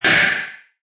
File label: Button Electronic Percussion Hit; Scifi Production Elements, Set 2: Button Electronic Whoosh